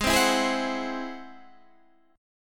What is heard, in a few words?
Ab Augmented 7th Ab Guitar Standard; strummed; 16,15,14,13,13,14; JGuitar